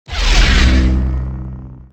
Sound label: saber on